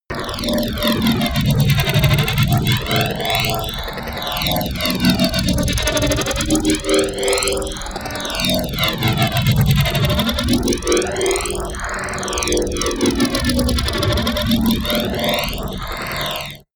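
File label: wobble robot talk low, science fiction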